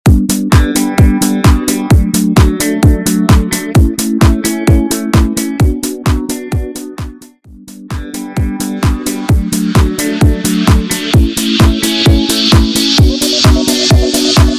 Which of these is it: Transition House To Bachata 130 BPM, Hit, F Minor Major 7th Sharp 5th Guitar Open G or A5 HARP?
Transition House To Bachata 130 BPM